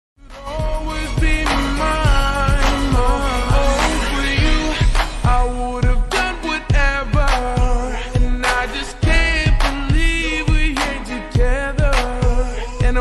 slowed english ringtone

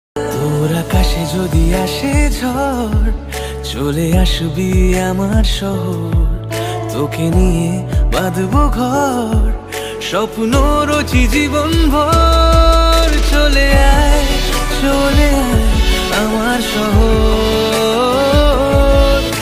bengali ringtone

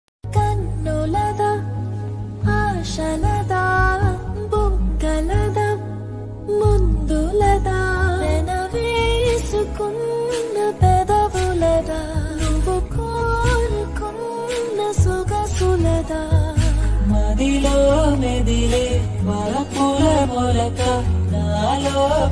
tamil ringtone

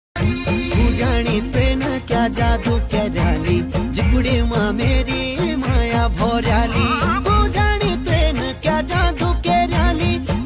uttrakhandi ringtone

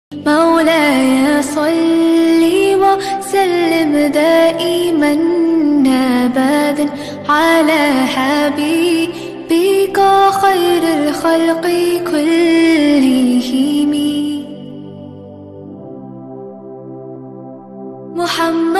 female ringtone

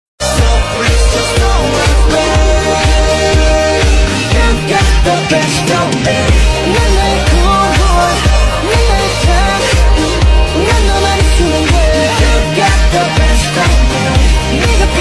korean ringtone

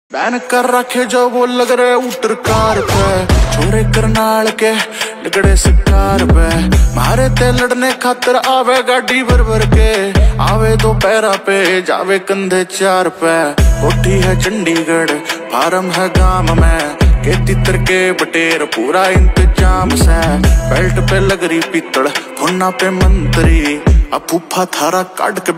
hindi rap ringtone

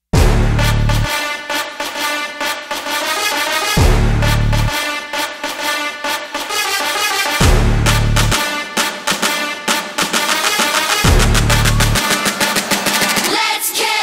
BGM korean ringtone

